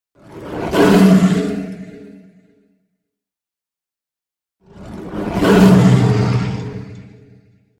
Animal stinger - Big lion growl roar w echoes x2; Hollywood Edge Sound Designers Tool Kit

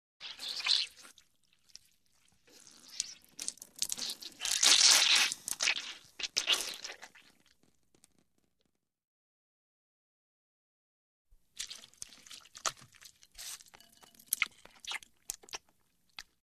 Wash Cloth Wring Out Water; Hollywood Edge Foley Sound Library

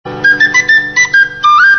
Funny SMS Ringtone

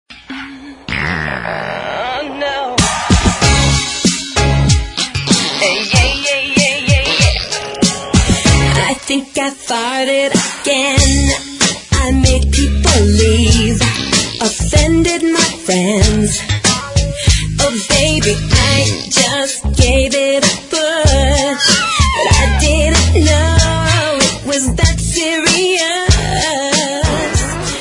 Fun Tones Ringtone

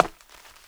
HARDWOOD, FOOTSTEPS; 1000fx

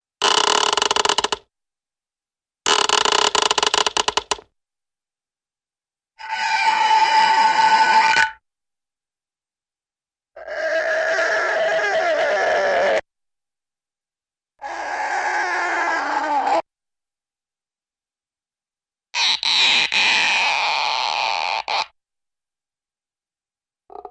Various Stretching; Hollywood Edge Cartoon Trax

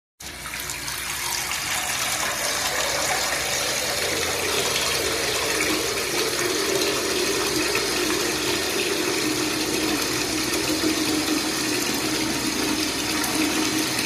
Bath being drawn; SOUND EFFECTS1

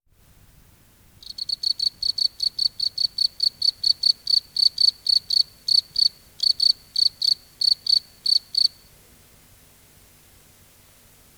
Crickets At Night In a Field; Dr. Sound Effects; 210 Nature Sounds - 20 Hours of Relaxing Natural Ambiences for Meditation and Sleep

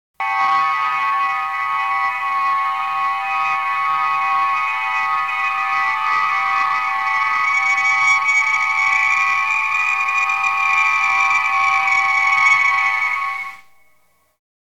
Transporter Materialization; Star Trek Original T. V. Series Sound Effects

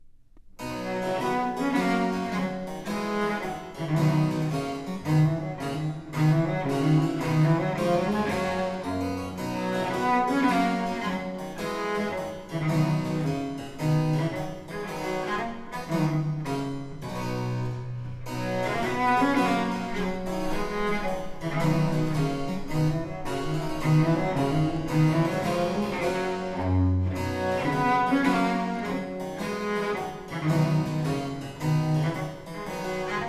Sonata 1 (Tempo di Gavotta), Cello Sonatas

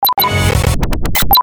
Sandstone Block; Best Of N2O Library; Breakers & Transitioners

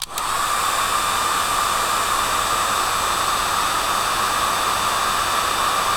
dryer, washstand

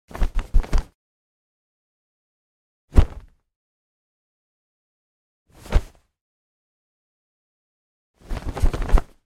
Movement 4X, Martial Arts and Human Impacts; Hollywood Edge Martial Arts and Human Impacts

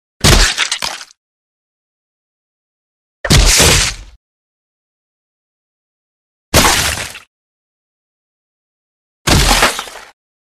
Bone Breaks & Blood Combo Impact With Bone Crunch; Hollywood Edge Foley Sound Library

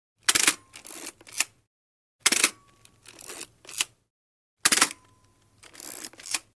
Antique Camera Shutter Clicks & Winds; Telephones

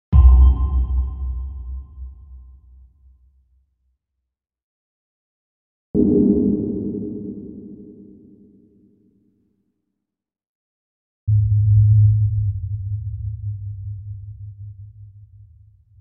Bass impact - Tonal sonar; Hollywood Edge Sound Designers Tool Kit

